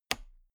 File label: toolbox latch close loose regular